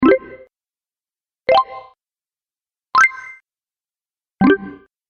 Positive Win Mini Bonus Collection, Video Game Sound Effects; Twistedtracks